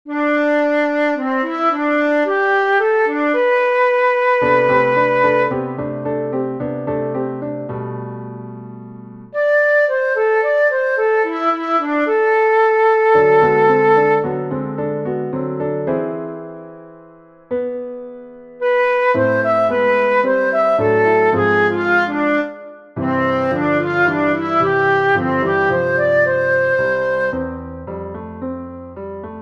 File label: flute piano; three duets